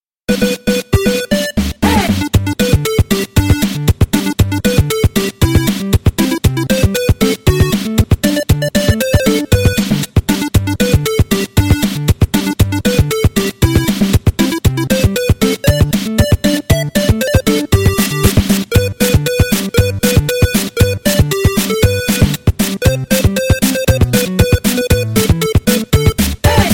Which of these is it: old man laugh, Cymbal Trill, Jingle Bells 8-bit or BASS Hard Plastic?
Jingle Bells 8-bit